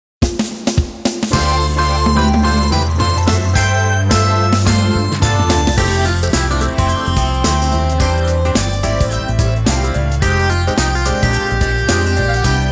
Music Menu event

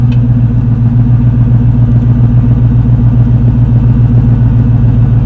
Motor; Smeshariki Cartoon Studio

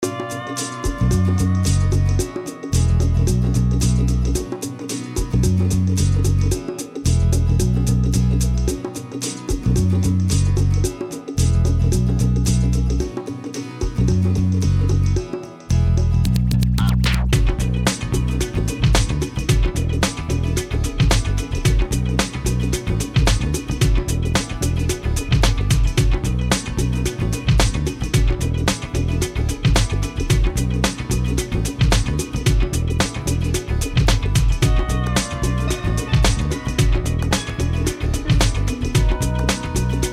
Tropical Groove; dave the diver soundtrack digital extra 2023

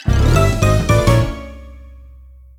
Stop; Super Mario Party Jamboree

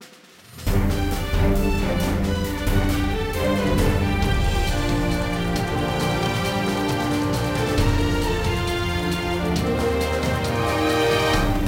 Win; Modern Combat 2 Black Pegasus

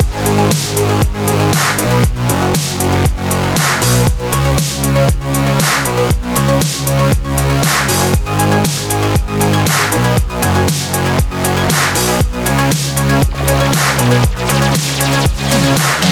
menu Loop

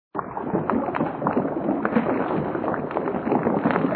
Rumble; Tails Nightmare 2

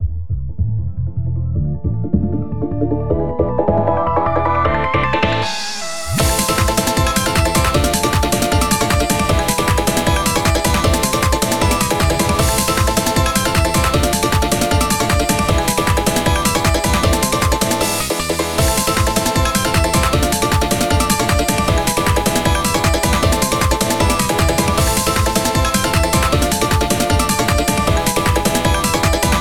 Instrumental; Friday Night Funkin Art Clash; Papercraft

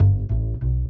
Bass Riff; the princess and the frog; Swamp; Music Ray

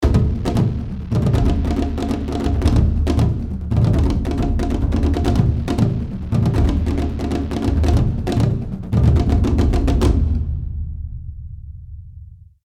Aloy attack stinger; horizon zero dawn

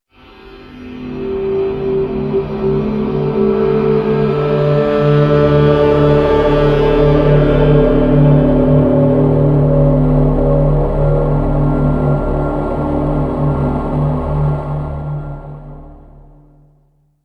Easter Egg Stinger; Zombie DLC5 - Tomb

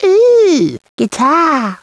Sound guitar

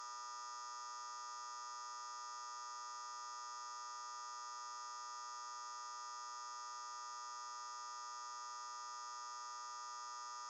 light fluorescent; teardown; ps5 windows xbox series xs

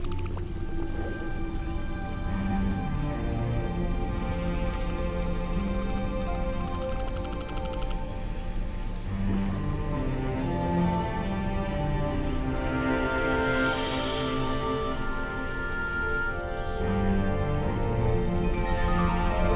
Safe Zone Swamp; Dungeon Hunter 2

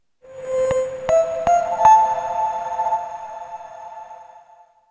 Logo Animation

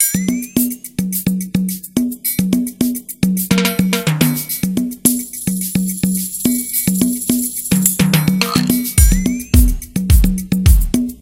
music loop; Zen Pinball Party; Trolls

